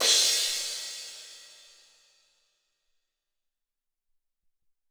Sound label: Crsh